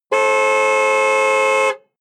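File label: sports car exterior horn single long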